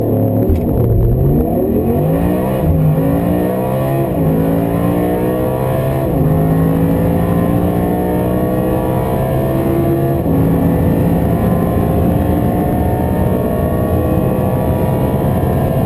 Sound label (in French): Harmoniques